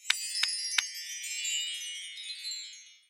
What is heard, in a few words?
cookie finished; Dorothy And The Wizard Of Oz - Cookie Magic